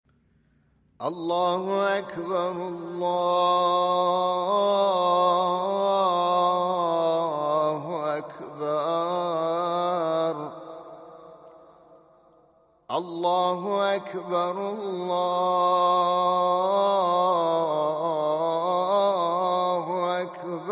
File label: azan